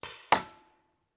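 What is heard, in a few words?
Paintball Gun Impact; Sound Ideas; Guns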